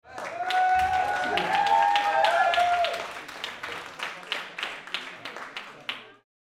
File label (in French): public applaud